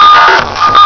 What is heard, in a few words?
jingle; peacecity